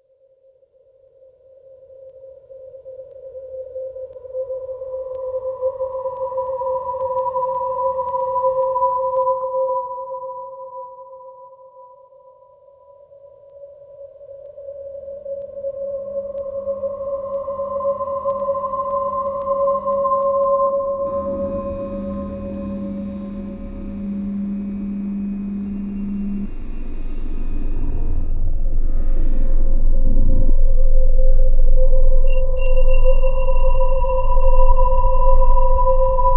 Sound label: Ambient; Survarium Diary